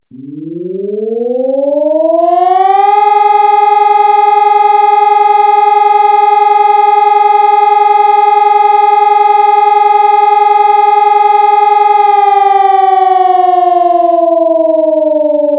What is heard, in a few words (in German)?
Sirenenton Feuer